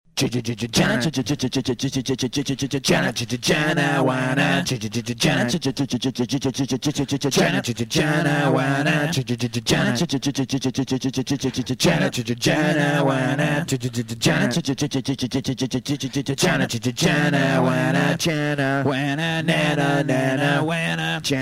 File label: harmonics song